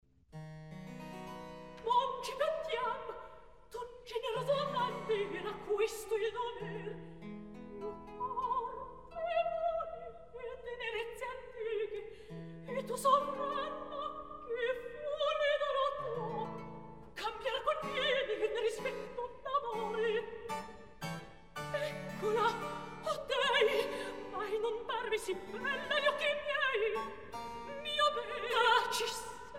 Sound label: Recitativo; Non Ci Pentiam; Mozart; La Clemenza di Tito